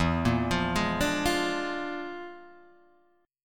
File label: E 7th Flat 5th Flat 9th; E7b5 B9 arpeggiated; JGuitar